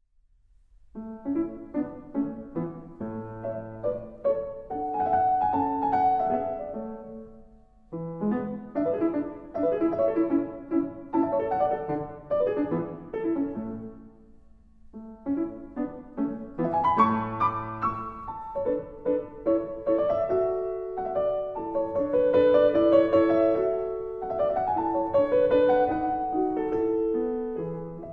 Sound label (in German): Sonate